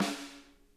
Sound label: VINT KITS; Sonic Reality - Vintage Timetraveler